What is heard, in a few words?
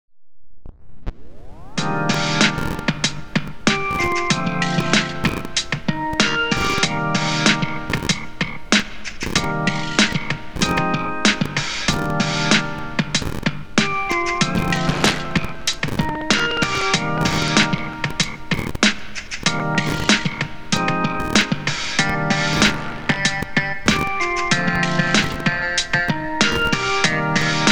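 scratch and breakdown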